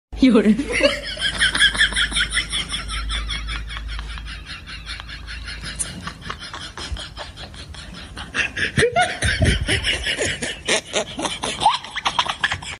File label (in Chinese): 哈哈哈无bgm